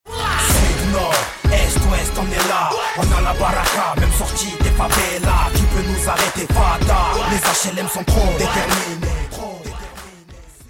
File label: win, music